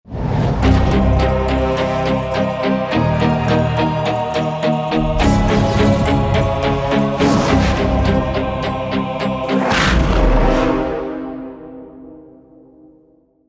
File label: lose; radiant